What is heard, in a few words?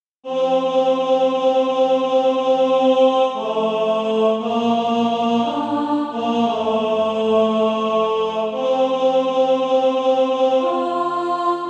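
alto, SATB Practise Music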